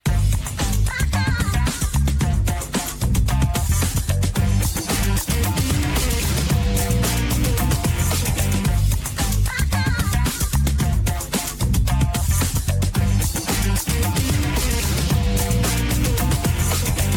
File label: Funk time; gestures; Fortnite